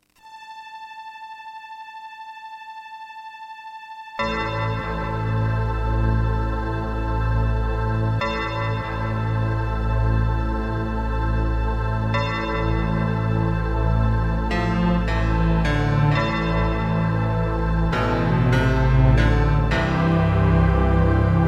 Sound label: Terratec MiniWaveSystem TTWT; Gabriel Knight 1